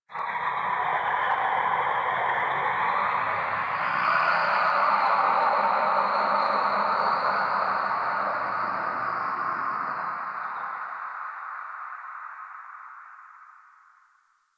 Main Room Whisper; Chimera; Ambience; One Off; Warframe; DE

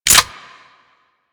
Reload Dual Pistols; Grn Queen Guard Dual Pistols

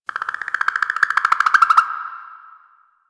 Drifter Camp Bird Close; Ambience; One Offs; Birds; Warframe; Quests; The New War

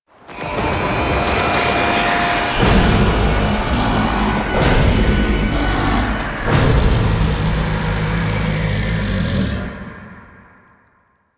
Alarm Waves; Mirror Defense; Warframe; Entrati

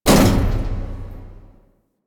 Railjack Weapon Two Hull Mech; Modular Weapons

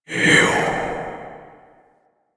Fire Whispers; Warframe; Tenno; Grimoire

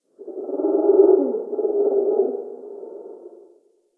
Forest Creature Night Monkey Low Distant; Ambience; Warframe; Grineer Forest

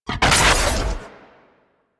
Arch Gun Primary Beam Shot; Warframe; Archwing; Hildryn

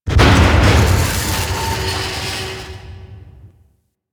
Reactor Explosion; Ambience; Warframe; Infested; Golem Trial

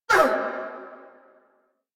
Pain; Grineer Queens Guards; Warframe

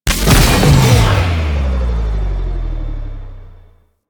Ambush Coildrive Trapped; Missions; Venus

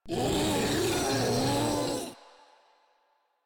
Infested Ancient Vocal Attack; Warframe; Proto Infested

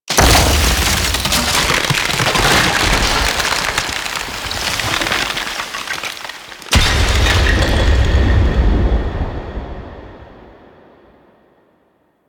Sculpture Ice Break; Mountain Pass; Warframe; Ambience; The War Within

